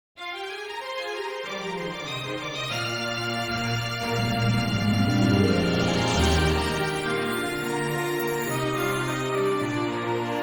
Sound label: Karaoke version; Pony Music Archive